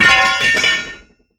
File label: drop weapon